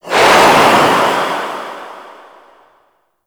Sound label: Sandstorm Gust Enemy Pickup; Warframe; Mummy